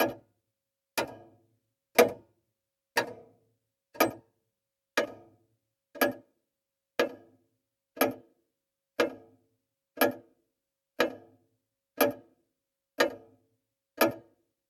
Grandfather clock tick loop; Devour

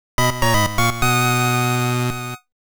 Insert Coin; Trigger Heart Exelica; Four Polyphonic Magic 3

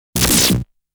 explosion sound; genshitou prehistoric isle

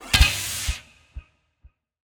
Attack Fire; portal quest android; Shadow Minion3

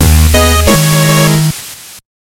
Music Boss Intro; Shovel Knight King of Cards

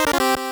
Stereo Pause; Bionic Commando; NES

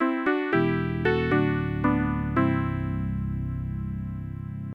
Title Jingle; burning dragon pc 9801 opn

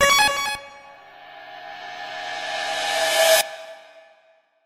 Retry Jingle; Friday Night Funkin Get Dunked On mod ost windows gamerip 2022

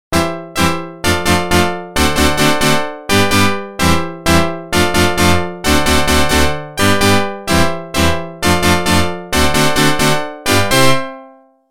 Organ; NHL 94

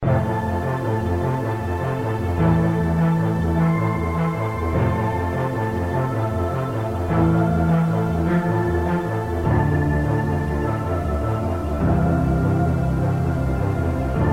synthonic; Cally's Caves 2; android game music

